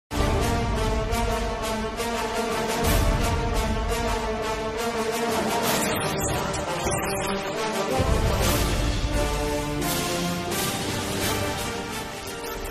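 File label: trailer bgm ringtone; ponniyan selvan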